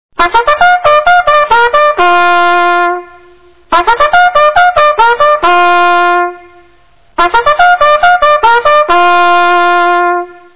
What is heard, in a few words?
water, Bugle calls; American Civil War